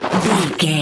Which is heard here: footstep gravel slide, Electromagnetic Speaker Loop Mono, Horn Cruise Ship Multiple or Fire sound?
footstep gravel slide